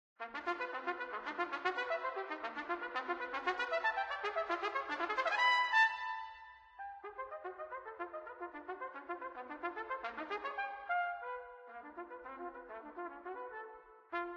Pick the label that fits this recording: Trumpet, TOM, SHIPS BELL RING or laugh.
Trumpet